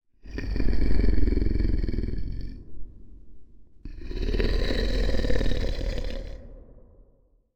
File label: monster snore